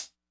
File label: hihat, Acoustic